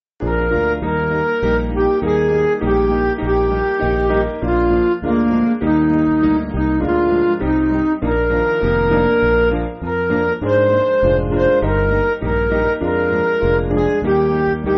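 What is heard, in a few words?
Music Backing Files for Churches; Lead Your Little Sheep; Sweney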